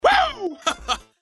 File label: power; Cartoon Network - Toon Cup 2020; maomao